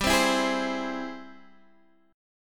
G sharp Augmented Major 7th G; G#+M7/G; strummed; JGuitar

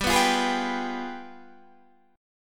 G 7th Flat 9th, Guitar, Open D

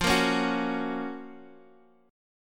Gb Major F; Gb/F strummed; JGuitar

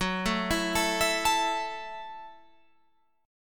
Gb Minor 7th, Guitar Open F; Gbm7; arpeggiated; 18,16,16,16,19,16

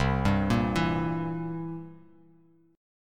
C 7th C down, C7; arpeggiated; JGuitar

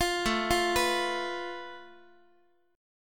A Suspended 2nd Sharp 5th, Guitar; Asus2#5; arpeggiated; x,x,8,7,9,10; 4A,4E,5A,5D,5G#,6C#; JGuitar

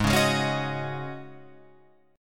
Ab 7th Suspended 4th Ab down Guitar Open F, Chord; Ab7sus4 strummed

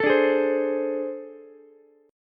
A Minor Major 7th Double Flat 5th, down, Ukulele; strummed; JGuitar

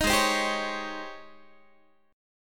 Ab Major 11th Ab Guitar; AbM11 strummed; 18,16,15,17,17,x

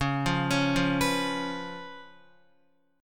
C sharp 7th C sharp, Guitar; C#7; arpeggiated; JGuitar

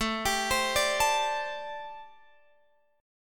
A Minor 11th A Guitar Open F; arpeggiated; Am11

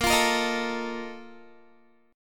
Bb Major 7th Suspended 4th, Guitar; BbM7sus4 strummed

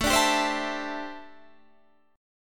D 9th Suspended 4th A

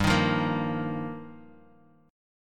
G Minor 7th Sharp 5th; Gm7#5; strummed; JGuitar; 15,18,15,15

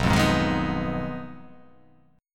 B 6th Flat 5th B down, chord

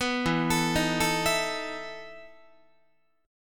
E Major 7th Suspended 4th E down Guitar; EM7sus4 arpeggiated

